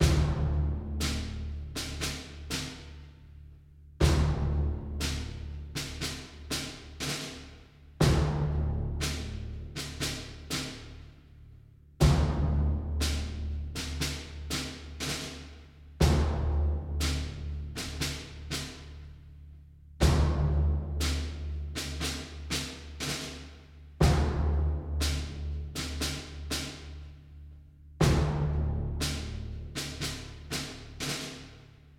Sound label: Music Debrief Bad Loop